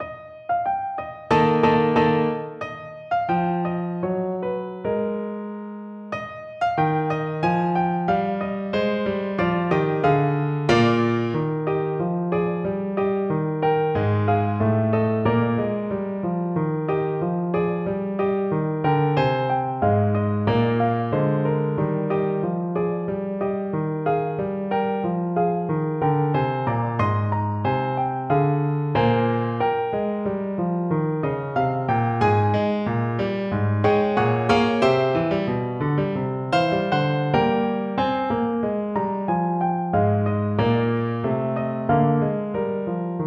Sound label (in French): piano